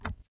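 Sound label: Metal run left, footsteps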